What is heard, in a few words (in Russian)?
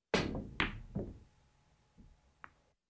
dvoynoy